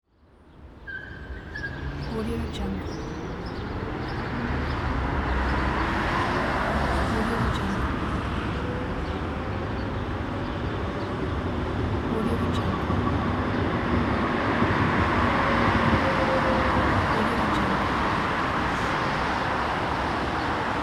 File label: city ambience